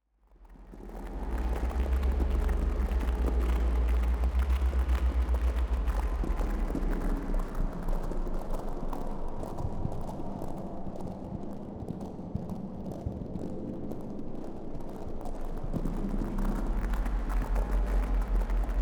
cave, Ambient Plant Music -- plant is triggering various instruments that create an "ambient; max-msp; Techniques and Aesthetics of Plant-Generated Control Voltage